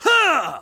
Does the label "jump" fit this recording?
Yes